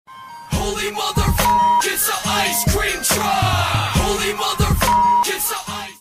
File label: icecream truck